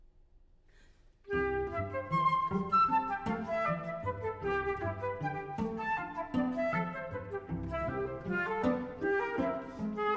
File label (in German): Trio für Flöte Cello und Klavier Allegro